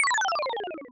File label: gameover; typing speed game